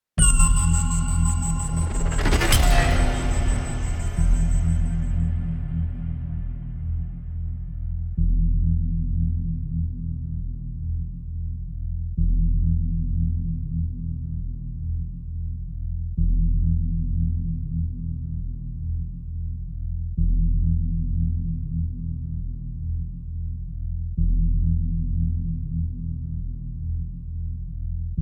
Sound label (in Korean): Tension 공포